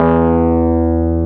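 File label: Wurlitzer E2, Keyboards Old School; USB Soundscan vol.09 - Keyboards Old School; AKAI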